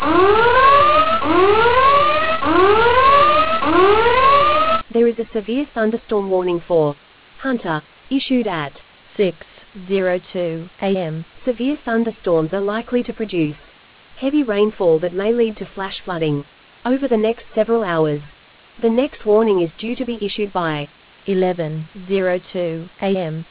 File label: storm audio